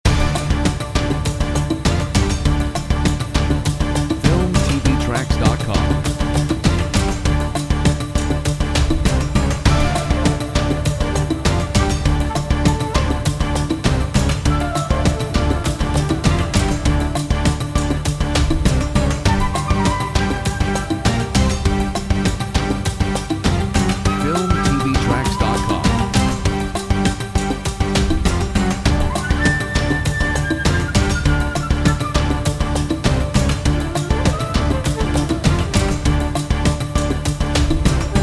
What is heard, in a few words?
Mission Completed